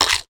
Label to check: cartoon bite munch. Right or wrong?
Right